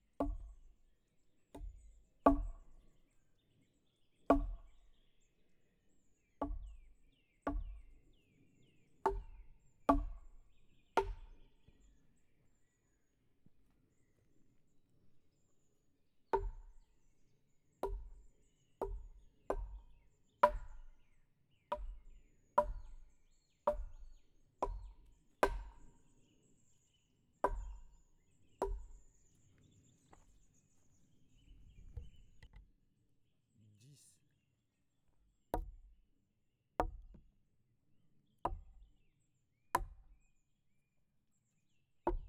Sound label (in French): Gourdin sur buches aïgues variées serie; Percu Bois